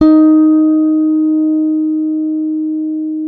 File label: D#4, FINGER MUTE; Xsample VOL-1